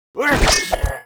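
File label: war attack, Mob; vaffernipper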